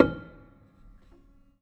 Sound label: Harm High, Piano Harmonics